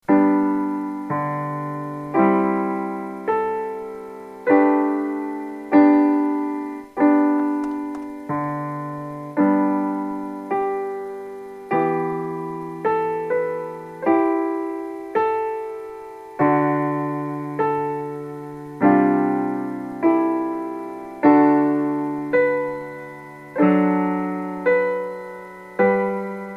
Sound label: TomTom